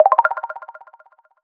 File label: Goal